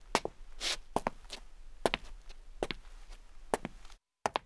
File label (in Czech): kroky konec; Mafie 1